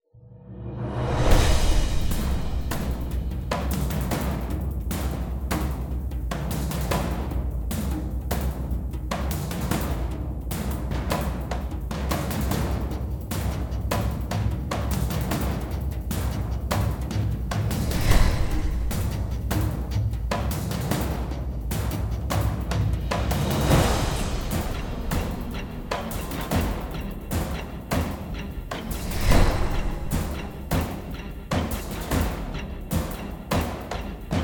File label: Combat Percussion Only; conan exiles gamerip 2017